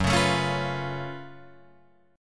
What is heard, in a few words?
Gb Minor Major 11th Gb down Guitar Drop D